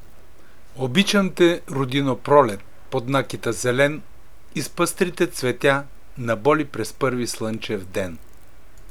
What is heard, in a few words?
kuplet